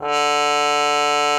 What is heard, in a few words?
cup mute D3; Xsample VOL-3